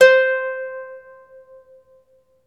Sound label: CELTIC HA, Celtic Harp